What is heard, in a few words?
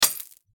glass, bullet hit